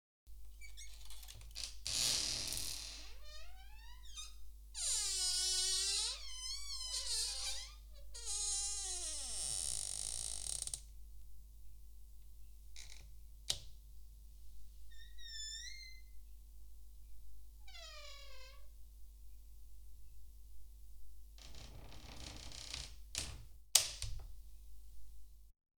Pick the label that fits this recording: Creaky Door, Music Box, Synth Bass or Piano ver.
Creaky Door